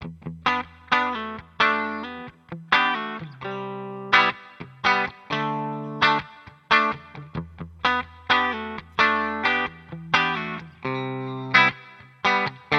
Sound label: clean